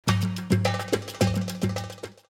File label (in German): Tabla